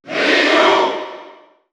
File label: Cheer German; Ryu; SSBU